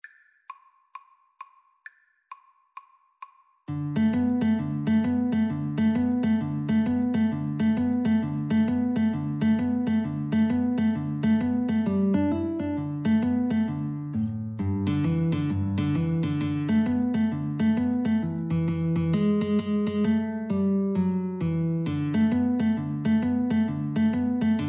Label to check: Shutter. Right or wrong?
Wrong